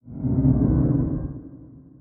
gamb enter; gamble; Butterfly Lovers; wazdan

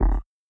minitables close; DraculasCastleWD; wazdan

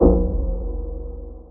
reels start; DraculasCastleWD; wazdan

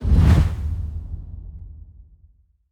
summary panel appear; Butterfly Lovers; wazdan

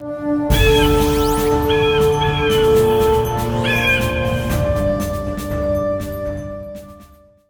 intro, American Poker; American Poker Gold; wazdan

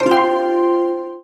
win; Butterfly Lovers; wazdan; ultrafast

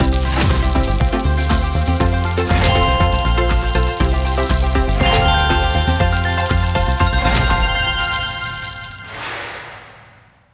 megawin; bigwin; Burning Stars; wazdan

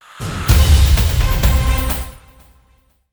normal win; American Poker Gold; wazdan

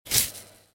cut flesh pain; Colin The Cat; wazdan

